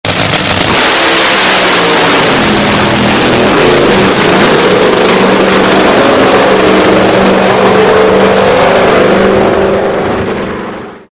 dragster

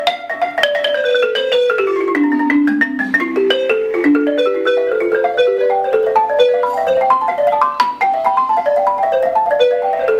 Balafon

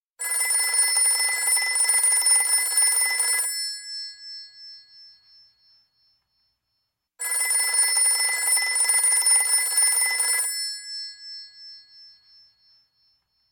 incoming call

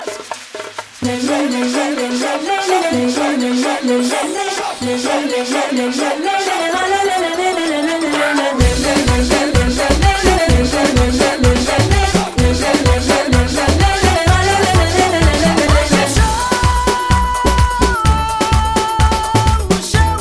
Is Calling Pop

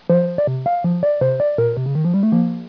levels

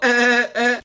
LAUGH

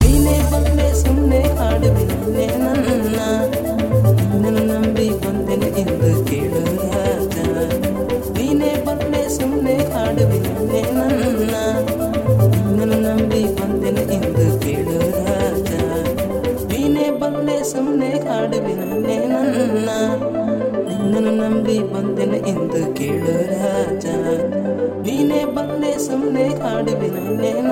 nokia asian mix

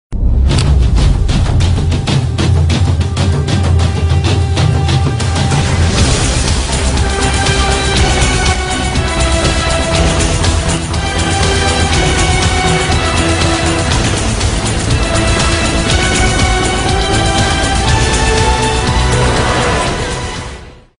action music

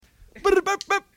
Arab Tribe Dance

